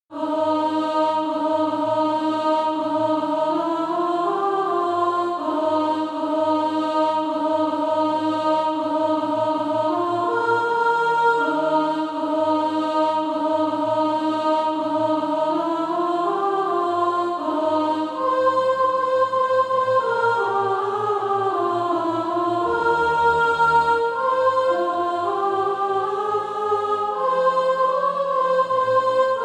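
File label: soprano, SATB Practise Music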